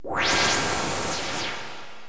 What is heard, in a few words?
scifi